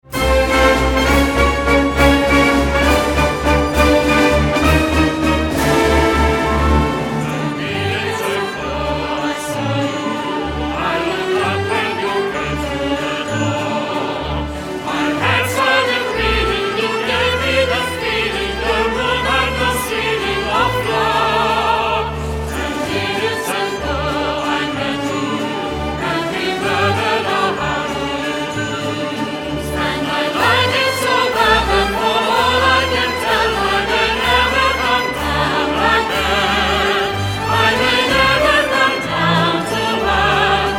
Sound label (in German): walzer